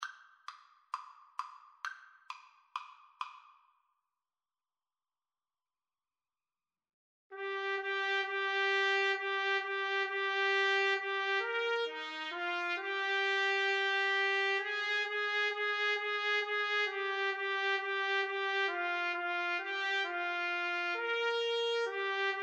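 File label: jingle bells boogie Trumpet Trombone duet karaoke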